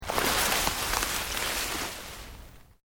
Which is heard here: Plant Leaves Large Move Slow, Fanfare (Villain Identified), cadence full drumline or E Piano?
Plant Leaves Large Move Slow